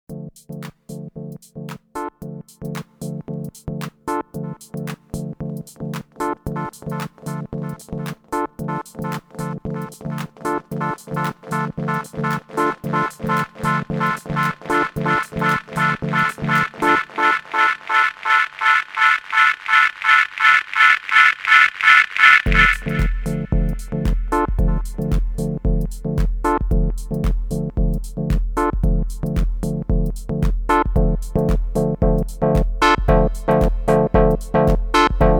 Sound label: dub delay env hardsync